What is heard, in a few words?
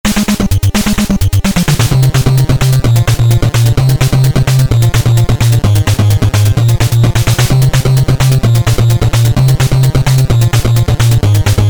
Boss Fight